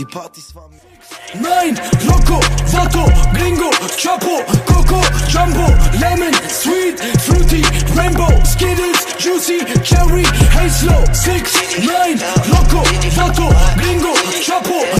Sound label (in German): deutsche rington